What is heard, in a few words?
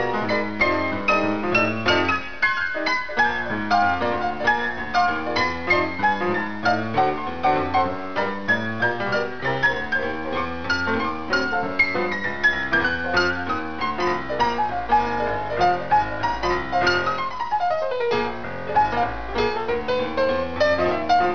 blues